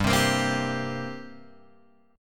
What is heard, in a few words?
G Minor Double Flat 5th, Guitar, Open G